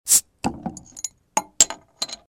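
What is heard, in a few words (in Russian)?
крышка отлетает падает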